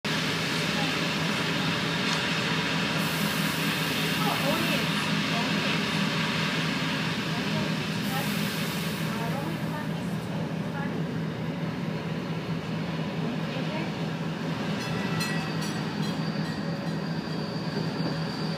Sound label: Train to Cividale